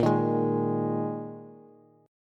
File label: Bb 7th Suspended 4th Bb Bass; strummed; JGuitar